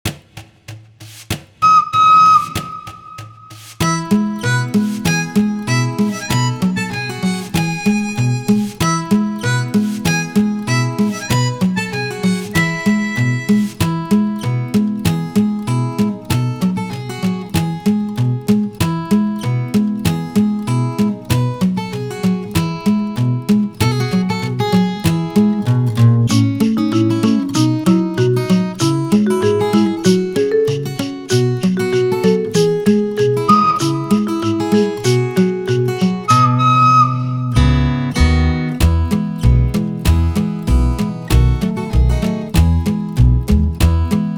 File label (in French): Le petit train suisse (Instrumental)